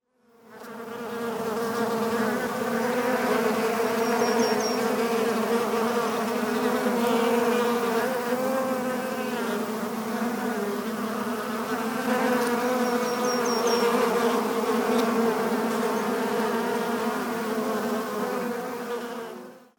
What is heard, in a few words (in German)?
honigbiene; vogelstimmen